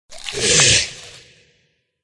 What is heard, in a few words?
riding bug attack voice